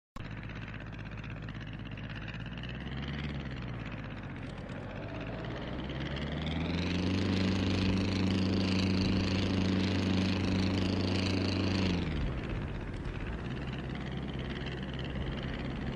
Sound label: Speedboat Sound; motor boat